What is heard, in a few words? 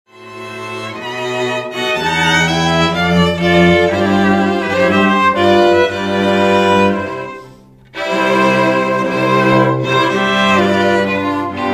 String Quartet Bridal March; Vyne